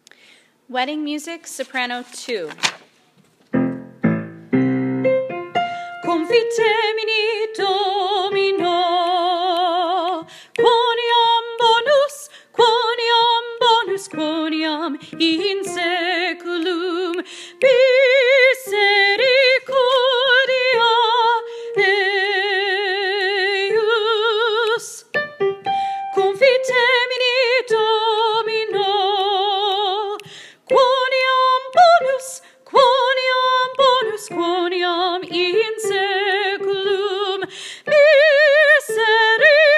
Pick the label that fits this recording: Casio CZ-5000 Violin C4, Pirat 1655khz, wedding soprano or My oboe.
wedding soprano